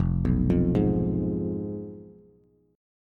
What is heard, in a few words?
F Diminished F down Bass; Fdim arpeggiated; JGuitar